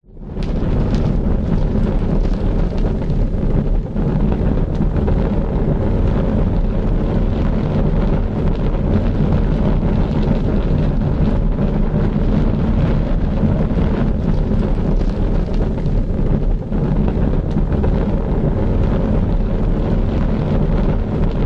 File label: nature fire flames large burn; zapsplat